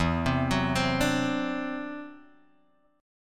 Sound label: E 7th Suspended 2nd Sharp 5th, E, down, Guitar, Drop D